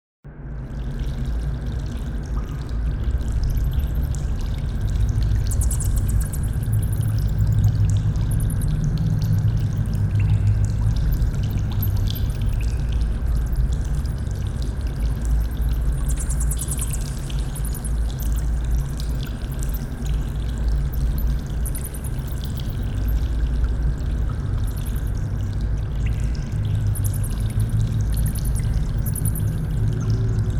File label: Caves